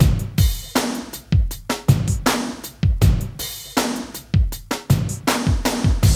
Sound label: BREAK